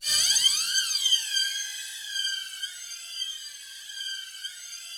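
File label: Rough Strings G5, Yamaha VP-1; 90 s Sample CDs; Music Store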